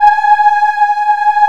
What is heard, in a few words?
G#4 WOM AH, Choir; USB Soundscan vol.28; Acoustic & Synth; AKAI